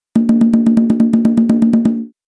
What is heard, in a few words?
congas